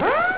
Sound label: siren